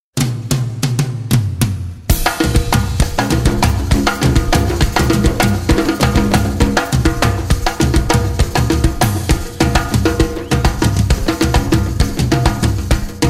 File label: Sega Tambour